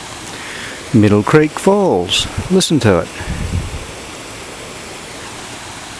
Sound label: Middle Creek Falls